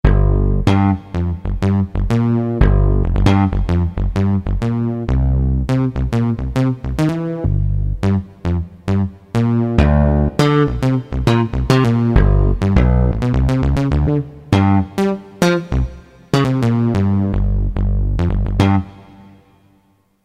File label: Big lo Bass, Kurzweil K2000; Kurzweil K2000 Value Pack; Xtra Programs; Jord Vox